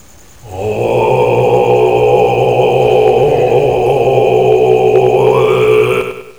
litania

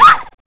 pain

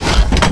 wood sliding door

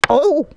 fall; monkey

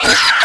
flyer pain; baseq2

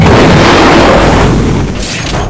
rocket launch, weapons

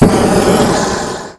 ignite, flare; zaero; weapons

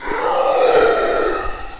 bump; vortex; wraith2

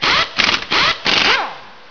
repair

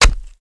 stab

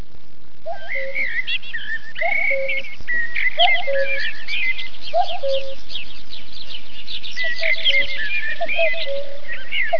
bird

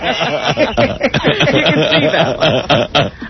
laugh; xquake

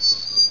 squeek

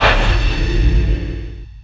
holy wind

